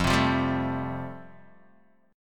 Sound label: F Major Add 9th, Guitar Open D